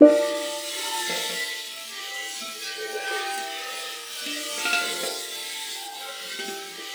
GLISS; Xsample VOL-4

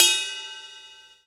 Misc Cymbal; EMU MoPhatt

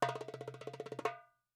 DUM ROL B, Percussion; Sampleheads; NYC Percussionworks; GJ PERC VOL2

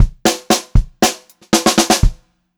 120 pop fill, Pop-Rock Drumloops

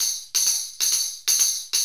Tambourine

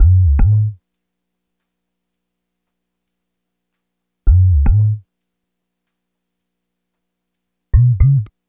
WD SUBBS; Spectrasonics; Liquid Grooves 1 & 2

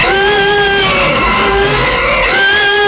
SI Fi, Drum and Bass Instrument Samples; EZ Rollers; Dogs

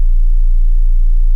BUCHLA, Rare Synthesizer; Hollywood Edge; Giorgio Moroder Rare Synthesizer Collection

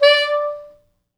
D4, Pop Brass; Best Service ProSamples vol.19; AKAI

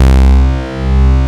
TONE C2; Best Service Dream Experience

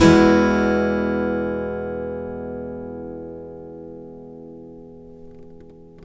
MAJ E UP, Blues Guitar; ILIO; Fretworks

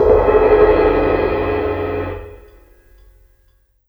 Cymbal; Sonic Foundry (Sony Creative Software); Orchestral Series VOL-4 - Rock and Pop

